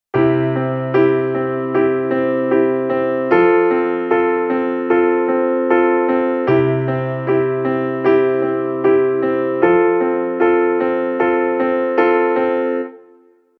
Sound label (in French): balade pop